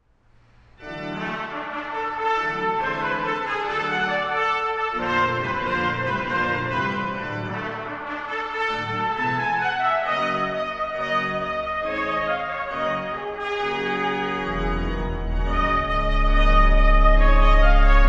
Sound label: flute and organ; Picardy; Santiago